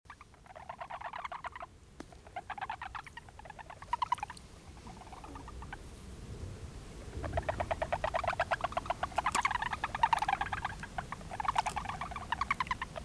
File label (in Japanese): 集まったアカガエルの鳴き声; 暖かな日差しのもと、冬眠から目覚めたアカガエルの声や、ウグイスの声が野に響き; 奥田の田; 今日、3月9日は、観察会「アカガエルのたまごをみる」でした。暖かな日差しのもと、冬眠から目覚めたアカガエルの声や、ウグイスの声が野に響き、ツクシやオオイヌノフグリを見つけ、春の到来が感じられる観察会となりました。